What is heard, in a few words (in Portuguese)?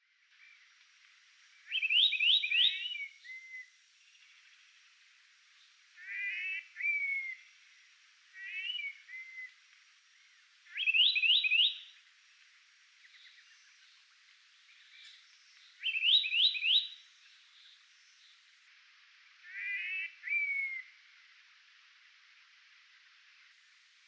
Canto do soldadinho